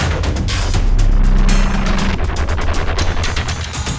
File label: Taunt; Skyway